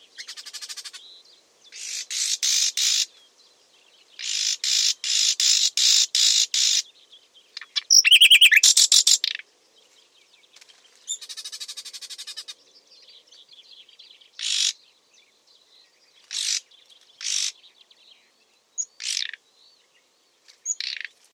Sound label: luscinia megarhynchos